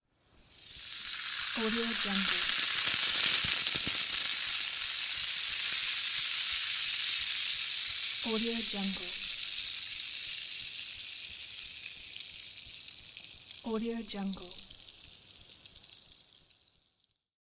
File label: effervescence chemical reaction